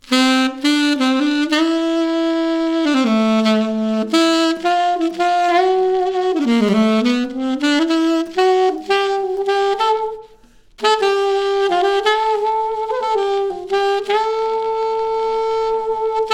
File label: Solo Sax